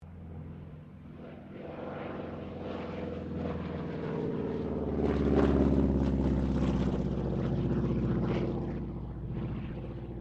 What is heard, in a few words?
AIRCRAFT T-28D TROJAN PASS; Airplanes